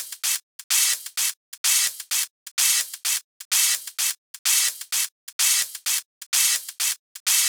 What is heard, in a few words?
Hihat Loop - 128 BPM; Drums; Loops; Top; House